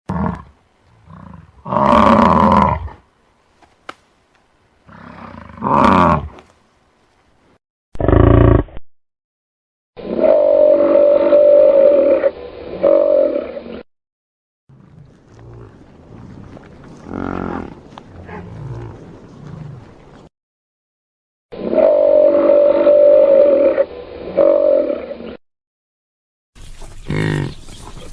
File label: Bison Sound